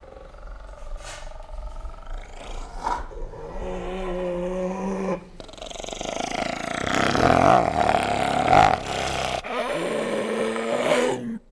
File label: Hyena Gasp Growl